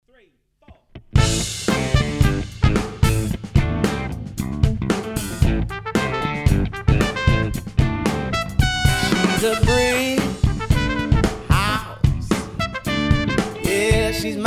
Motown Live

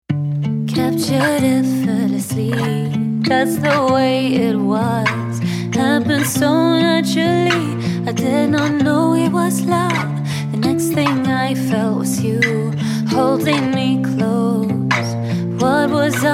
Acoustic Duo; London

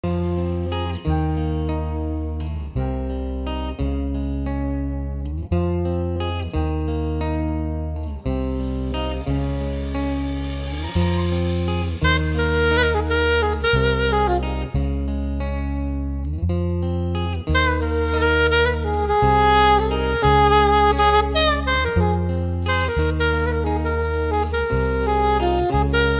sax

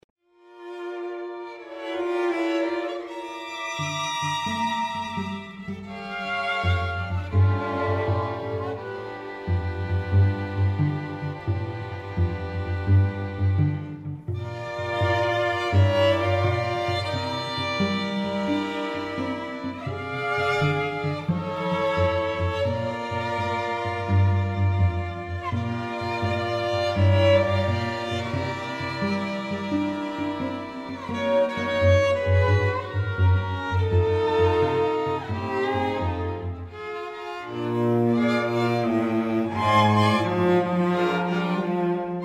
String Quartet; London

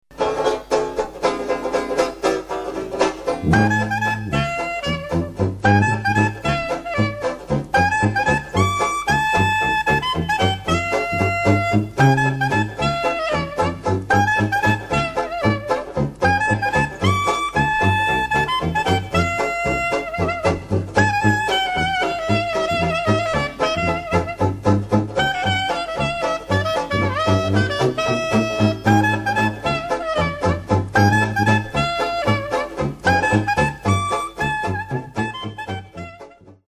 jazzband

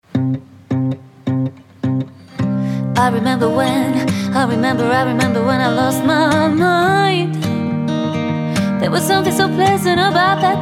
Acoustic Duo; London

